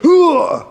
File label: "huh"